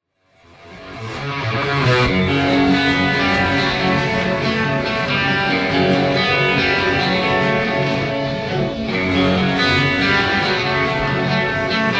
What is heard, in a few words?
nocturne hidden guitar